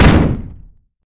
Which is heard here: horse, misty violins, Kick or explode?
explode